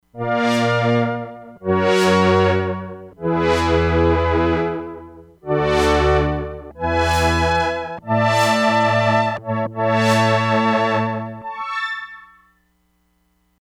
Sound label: STRINGS-BRASS, Crumar Bit 99; Factory Programs